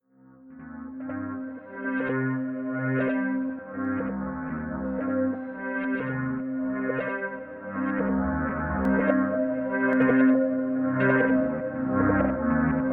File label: Reaper MIDI